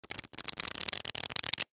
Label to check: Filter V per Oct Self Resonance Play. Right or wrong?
Wrong